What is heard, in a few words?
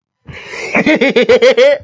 goofy laugh